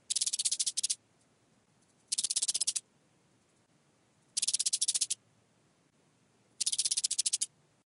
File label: Desert Cricket; lethal company; ambience; windows